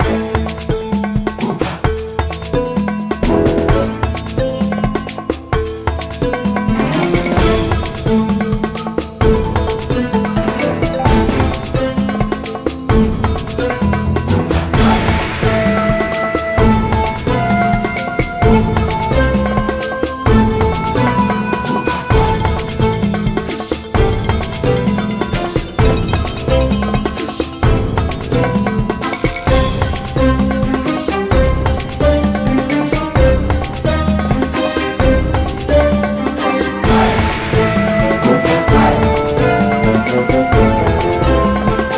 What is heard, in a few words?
Jungle; Hugo Penguin Battle